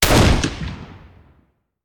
Rifle; Sound Ideas; Guns

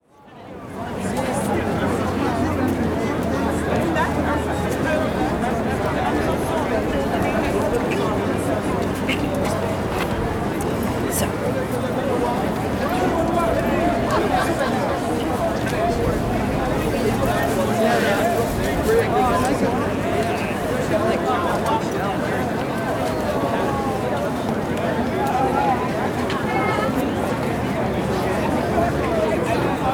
Crowd Outdoor Town Square; crowd walla; Sound Ideas; Walla Series

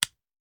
Hand Gun Foley; Sound Ideas; Guns

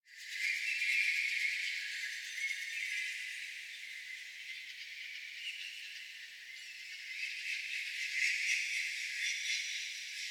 Bird Ambience Mystic Place Many Bird Layers; Hollywood Edge; sound designer's toolkit v2

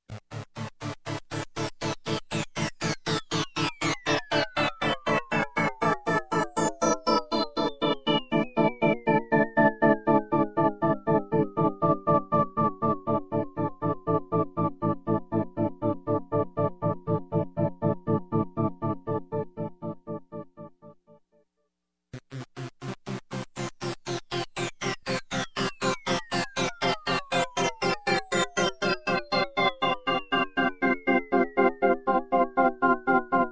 Space Mystery Tune, Electronic, Music; Sound Ideas 2000 Series

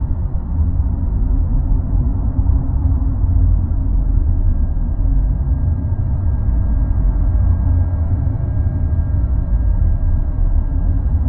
Sea Treasure Room

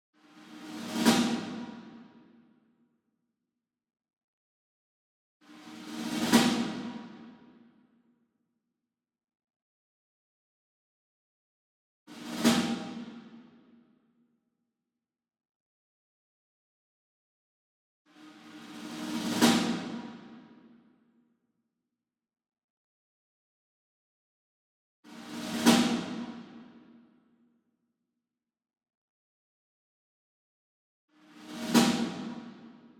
SINGLE SNARE Brady Jarrah Block Roll Far; boom library; Cinematic Strikes Construction Kit

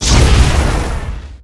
Menu Enter

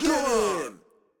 Crowd Cheer; Pitch Black Audio Pong

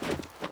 snow; firefight

